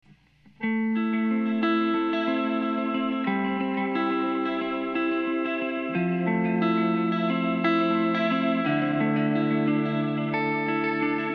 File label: compboost